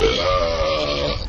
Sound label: burp medium